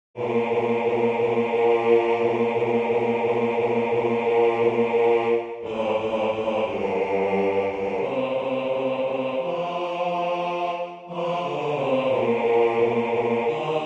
Nous t'honorons glorieux saint Joseph basse

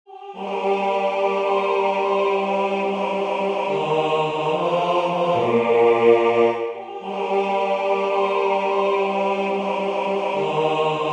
Jai vu des fleuves deau vive basse

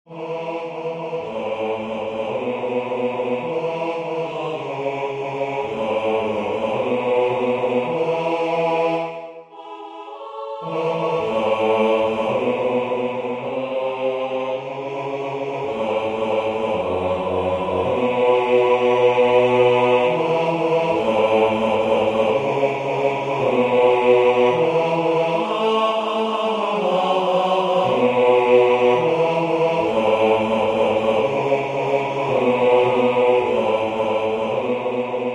Nous venons nous consacrer basse

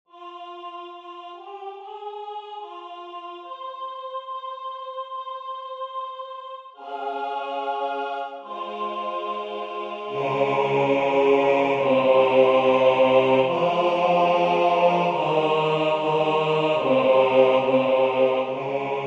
Entre le boeuf et l'âne gris basse

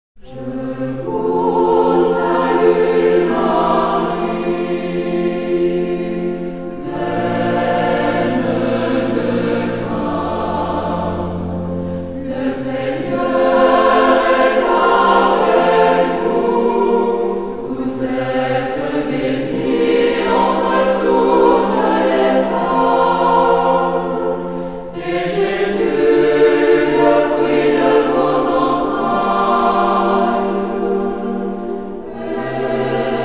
Je vous salue Marie Ensemble vocal; l Alliance

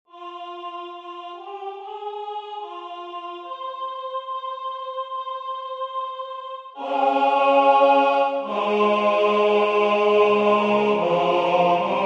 tenor; Entre le boeuf et l ane gris

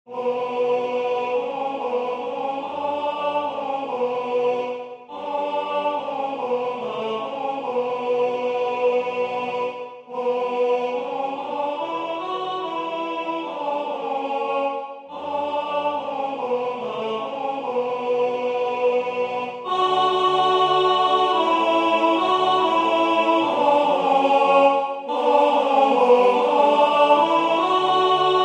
tenor 2; O nuit brillante

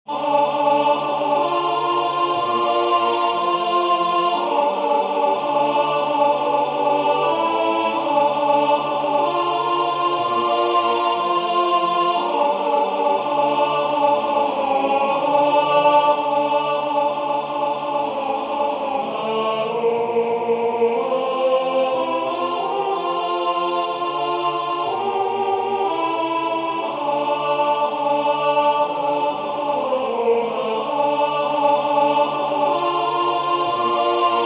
alto; Benis le Seigneur o mon ame

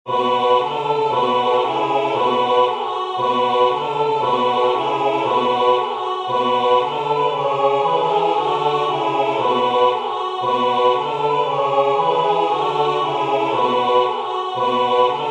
voix I; Alle psallite cum luia

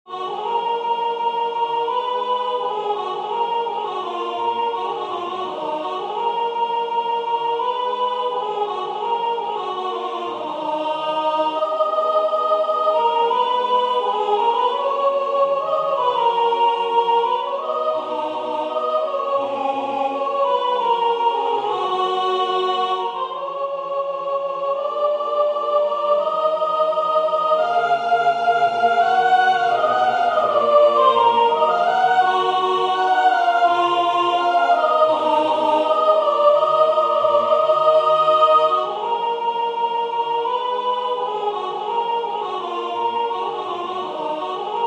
soprane avec ornementations; Accourez choeurs angeliques

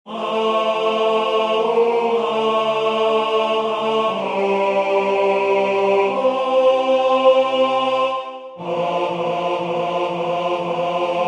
tenor; Ave Maria priez pour nous

